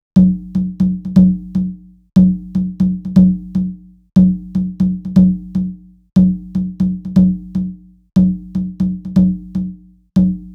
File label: Echo; Abeton 10 Test